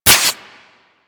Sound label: Inflate; Peculiar Inflation Mod